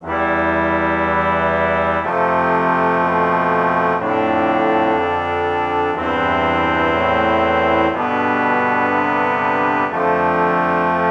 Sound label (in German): Stimmiger Contrapunkt; Ronge; corretto